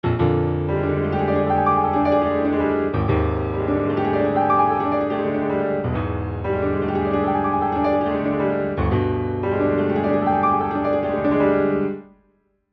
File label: Steinway B close